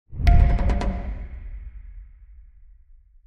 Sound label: end quest